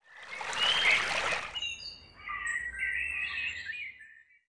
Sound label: boat; ancient